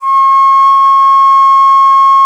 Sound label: alto bon, India winds; World Combos; E-MU Producer Series Vol. 7 – Old World Instruments